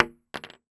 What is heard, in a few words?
Weapon Bullet Shell 7MM Dropping On Hollow Wood; AdobeCC